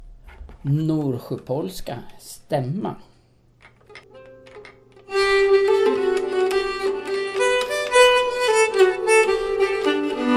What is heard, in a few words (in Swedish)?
Norsjöpolska stämma